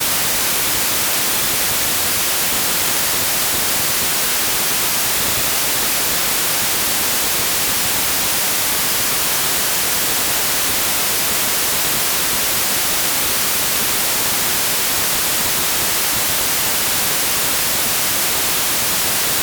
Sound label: White Noise